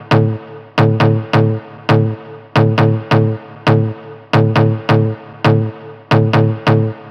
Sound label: Synth Loop D 135, Techno